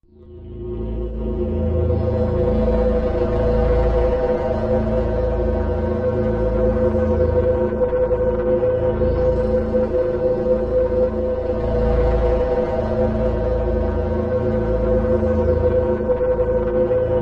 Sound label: Pipe Dream Machine, ambience